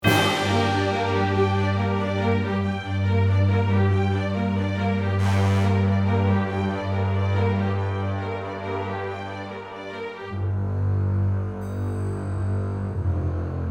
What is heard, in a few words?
backing track; cello